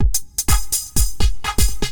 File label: HOUSE 125